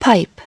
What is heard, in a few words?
PIPE